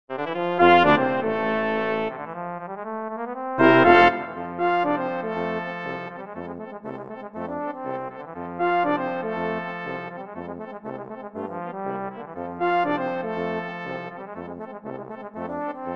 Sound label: Trombone; Lassus Trombone